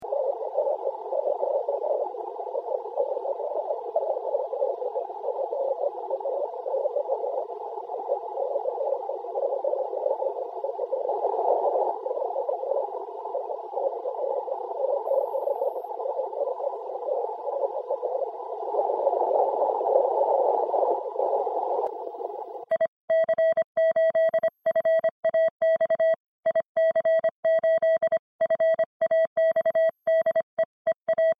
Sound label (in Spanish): contactando con migo en CW; 144; IC8FAX, contactando con migo en CW, en una FAI, a unos 1.914 kms en JN70